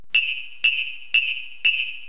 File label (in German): Echolot